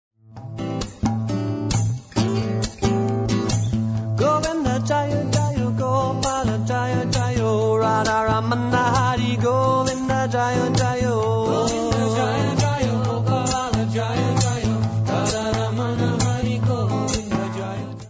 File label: Govinda Jaya, Guitar